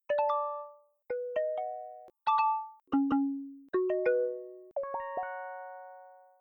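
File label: Achievement Chimes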